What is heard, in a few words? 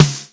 R8 Snare, Roland R-8 drum machine